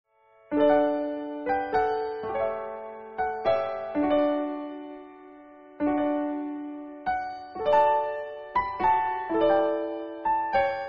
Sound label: Lullabye